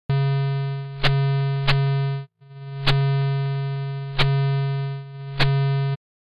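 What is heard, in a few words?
Summed output of 40 fully-connected phase pulling oscillators (sine tone) synchronizing with a period 60 pulse (noise burst) with some random noise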